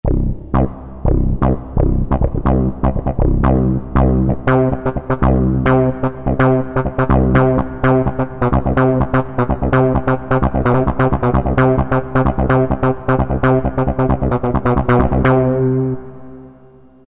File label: Syn Bass, Roland MT-32